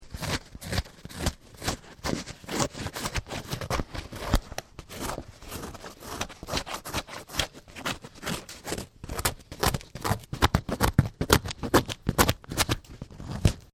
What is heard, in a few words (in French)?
gratter une peau